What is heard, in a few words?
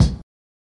Live Kick Drum